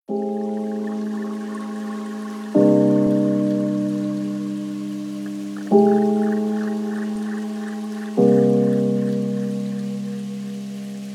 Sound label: Meditation Music